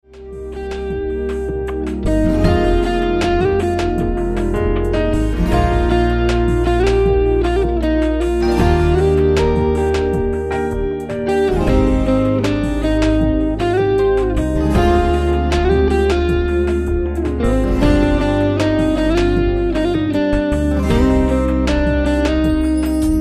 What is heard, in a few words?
instrumental